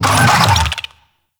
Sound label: Infested Big Ashen Vocal Pain; Warframe